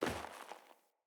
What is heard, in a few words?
Sandals Land Snow, Footsteps; Player